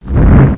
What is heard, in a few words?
rumble; Cube